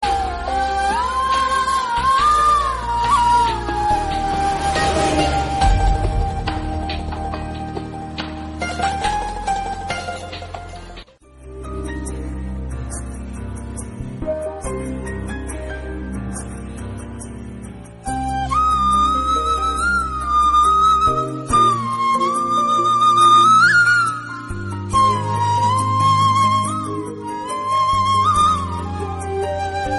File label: Bgm Tamil Ringtone; Gilli